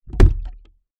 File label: industrial bucket plastic full of water down; zapsplat